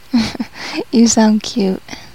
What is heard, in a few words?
aha u sound cute